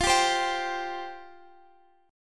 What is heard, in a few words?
F Suspended 2nd F down Guitar Drop D